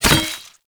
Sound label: Boomerang Catch, Weapons; Infested